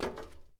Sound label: walk metal; Escape from Tarkov